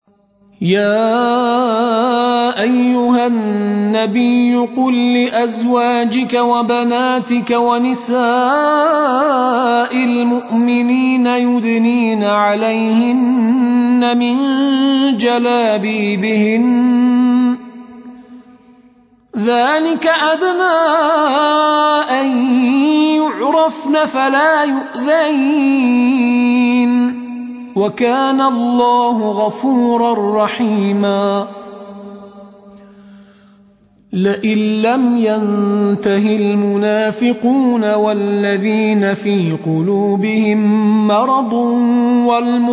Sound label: Tar